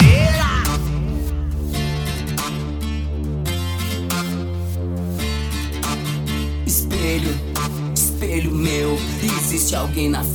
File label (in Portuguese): explosao das maquinas bass